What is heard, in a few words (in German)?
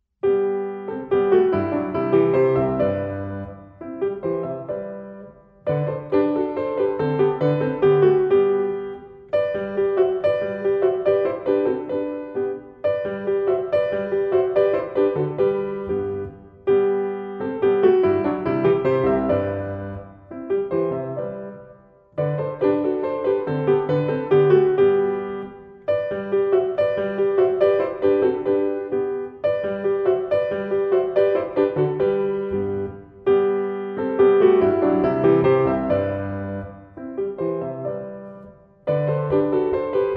piano solo kinder, piano kinderlieder; Die Henne